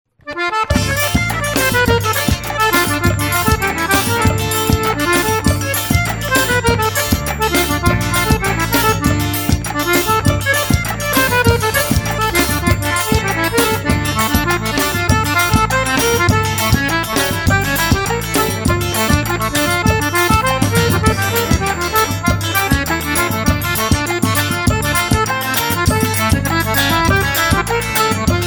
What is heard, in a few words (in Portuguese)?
acordeon; festa do chitão